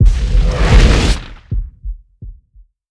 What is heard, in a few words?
Effect Telekinesis End; Lucius III